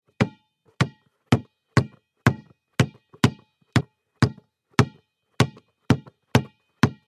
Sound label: Basketball bouncing the ball hard surface